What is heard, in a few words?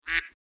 quack